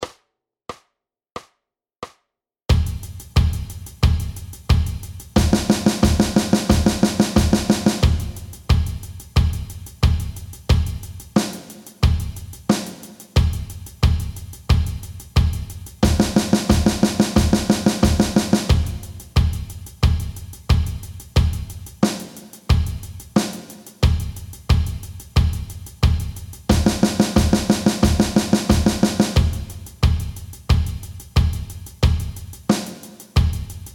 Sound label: Drum Warm Up; Drum Lesson